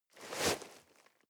nylon leather soft short

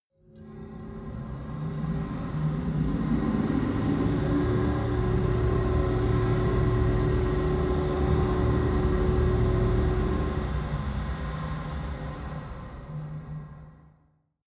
machine howl crackle

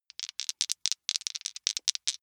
big two rattle in hand

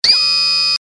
servo action style

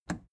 plastic button scratch smooth release enable

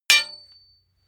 hammer hit metal dull

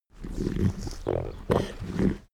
goat sick growl

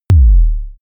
sub kick short

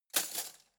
cutlery spoon drawer place hard

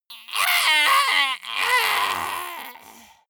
monster die high

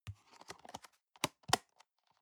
plastic box food small close soft

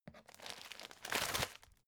folder file jacket turn hard

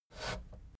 fader plastic scrape single up halfway medium

